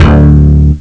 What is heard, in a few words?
slap bass, Amiga Soundtracker; Amiga Soundtracker Sample Packs